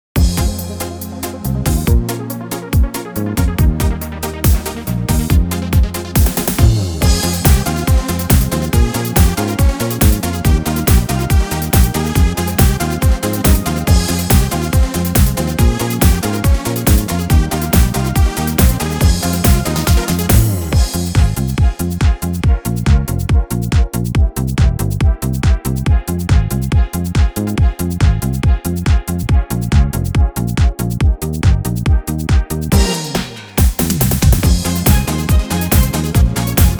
Night Disco

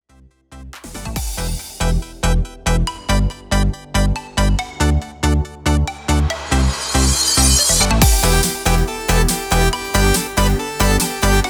Electro

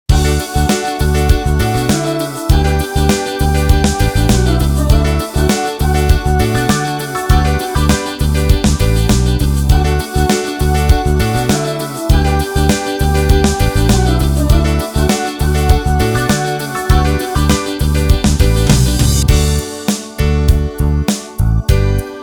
Disco

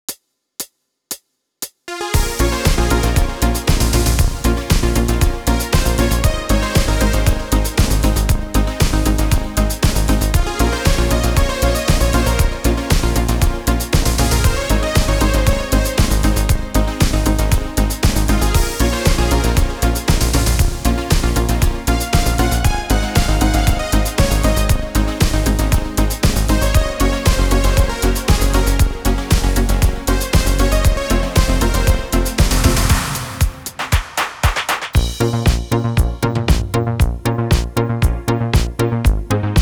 Fancy Disco

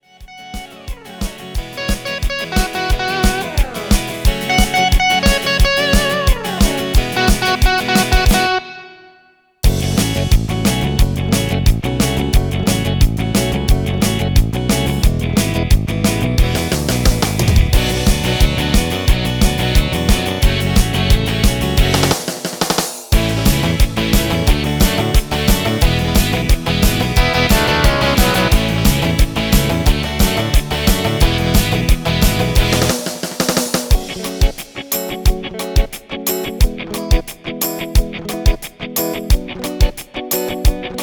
Rock

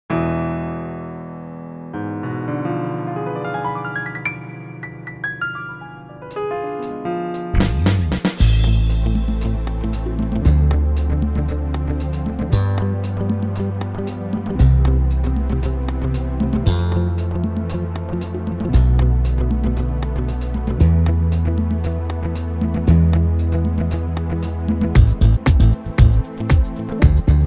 Disco

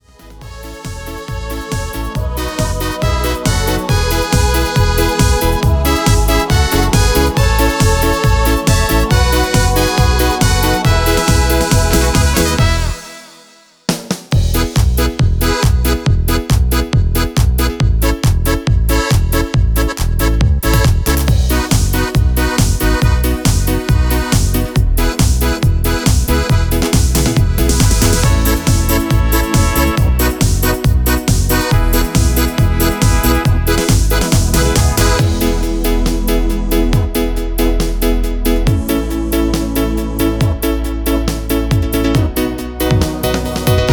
Schlager

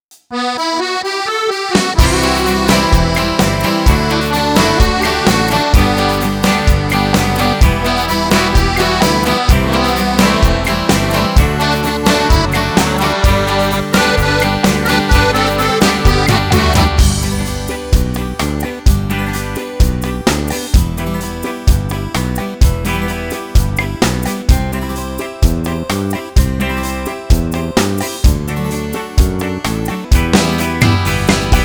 Shakin Ballad

